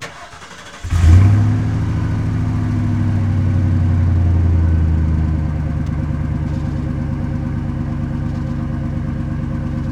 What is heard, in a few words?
Truck Expedition Start Loop; Trucks